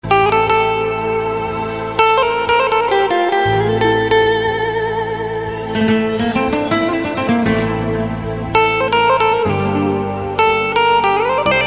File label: Ringtone